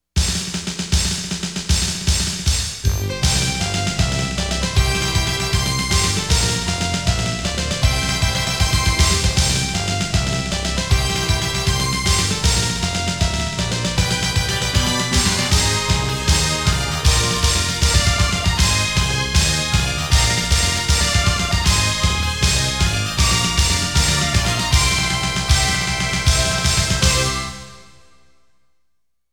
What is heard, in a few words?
A Victory March; Konami Game Music Collection